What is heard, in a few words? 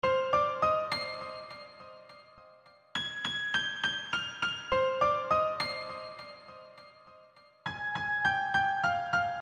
205 BPM C minor